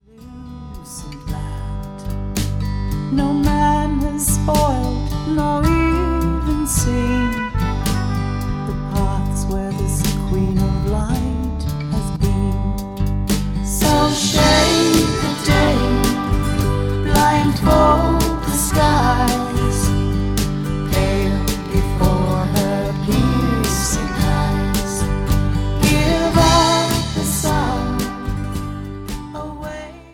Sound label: fanfare